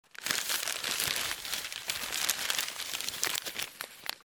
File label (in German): mikro nahe zeitung zerknittern